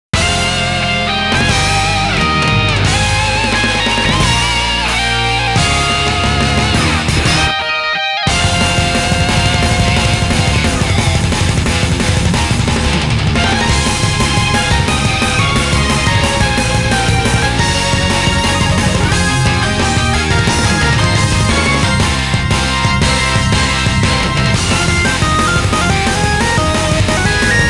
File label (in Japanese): BGM7 殲滅; general gear sound collection vol.1